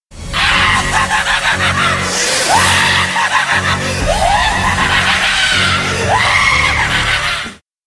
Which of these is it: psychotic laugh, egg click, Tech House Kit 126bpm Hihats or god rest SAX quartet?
psychotic laugh